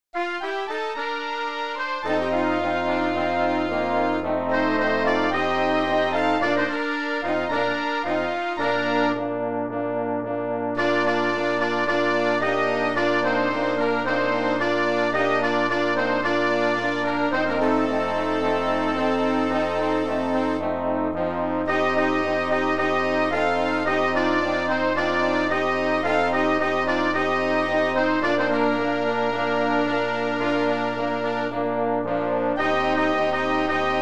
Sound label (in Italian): fanfara tango